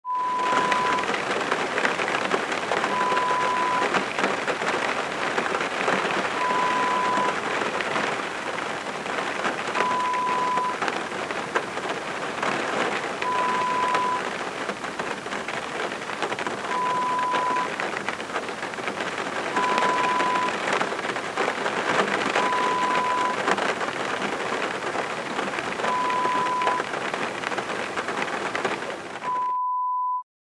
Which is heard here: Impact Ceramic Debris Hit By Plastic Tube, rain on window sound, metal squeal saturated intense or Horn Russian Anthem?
rain on window sound